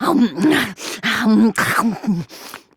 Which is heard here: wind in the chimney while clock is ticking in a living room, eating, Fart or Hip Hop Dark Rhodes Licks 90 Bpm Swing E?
eating